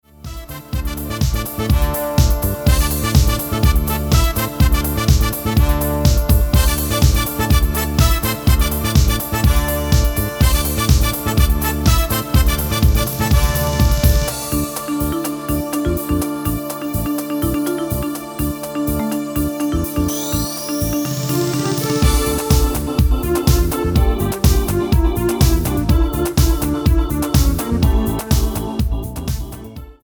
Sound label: Samba